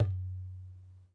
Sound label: Ghe Open, Tabla; ETHNIC and WORLD PERCUSSION; Tabla Kit Large